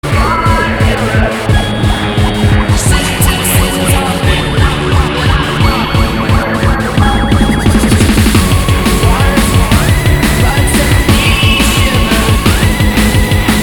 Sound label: Drum & Bass, Oldskool, Jungle & Dubstep